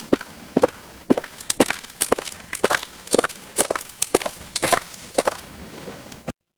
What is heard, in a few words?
footsteps gravel